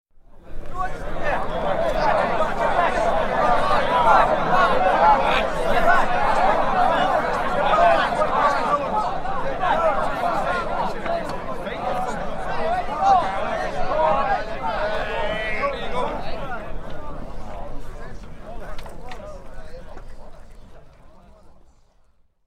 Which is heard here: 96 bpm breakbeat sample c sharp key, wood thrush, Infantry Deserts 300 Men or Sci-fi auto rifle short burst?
Infantry Deserts 300 Men